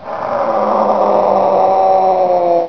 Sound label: die